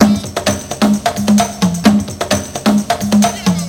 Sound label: Perc